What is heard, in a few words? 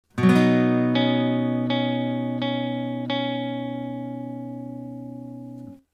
maj scale; fretjam